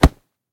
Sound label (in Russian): slabij korotkij udar po sportivnomu inventaryu